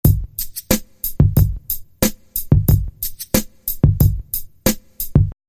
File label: Drum Loop